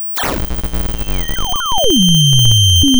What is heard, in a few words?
pop